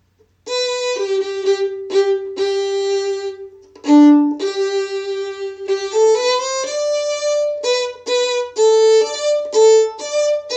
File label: Hunters Melody